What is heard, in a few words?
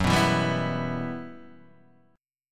E Minor F sharp, chord; Em/F# Chord {2 2 2 0 0 0} strummed